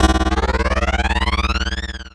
HITS, Special FX Twisted; USB Soundscan; AKAI

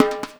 REPIKE, Percussion Slam; InVision Interactive

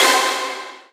Synths Root C

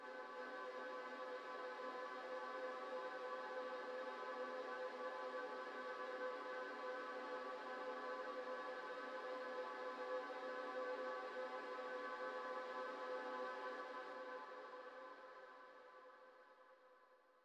quicksilver pad emaj

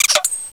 EHHEHH

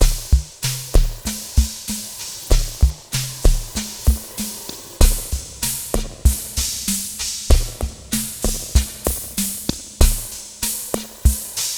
Acid Mod Drumz, lounge, 096; Zero-G Kocktail Kollection; Construction Kitz

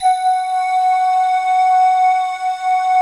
glockenflute, Bell Flute; Optical Media International; Sonic Images Library

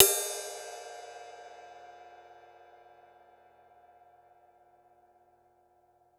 CYMB; Houseworx; Drums; Cymbal

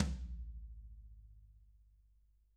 TM16 HIT, Drums, TAMA; ILIO; Double Platinum Drums 1; TAMA+TAMA

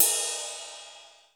MPC1 RIDE, drum; Crypton - DrumBase5000; MPC DRUMS

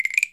SHORT, PERC, Percussionworks; Sampleheads; NYC Percussionworks; 90 s Sample CDs

